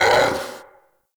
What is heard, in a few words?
Battle Dog Pain; Animals; Vocals